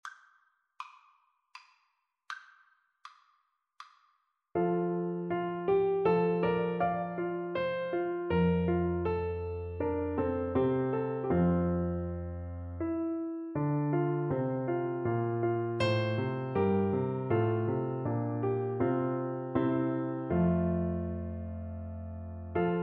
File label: Soprano Saxophone